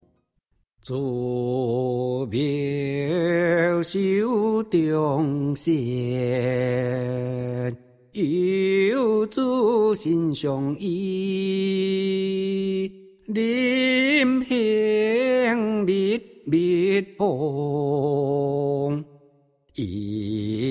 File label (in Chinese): 遊子吟 吟誦; 母親節